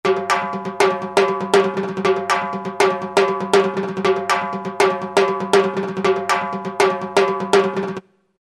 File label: 120, Latin; World; TmblsLp2; Roland SR-JV80-18 World Collection Latin